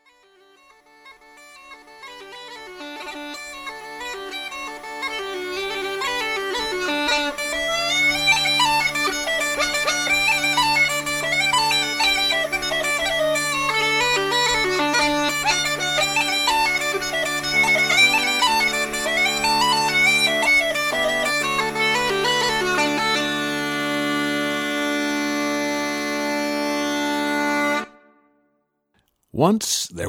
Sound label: Bagpiper; Portland